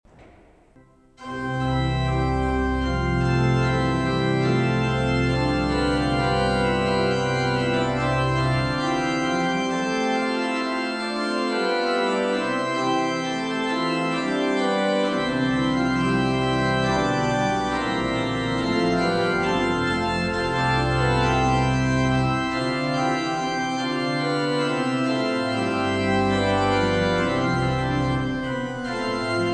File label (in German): Orgel; Jesus ist auferstanden, Alleluia!